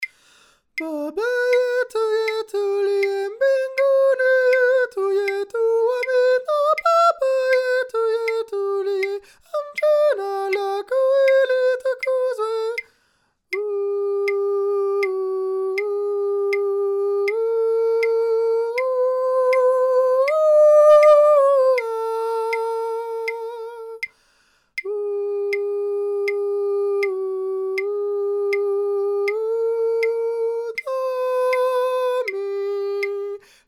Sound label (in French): soprane